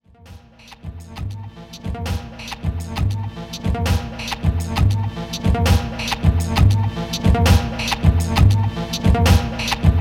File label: Loop B; looped; SOMETHING VINYL SERIES; SVS analog catalog